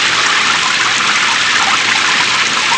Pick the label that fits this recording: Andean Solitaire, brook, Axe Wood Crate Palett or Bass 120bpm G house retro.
brook